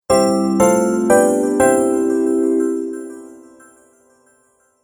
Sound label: Game Award Logo, Video Game Sound Effects